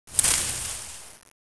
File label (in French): plantes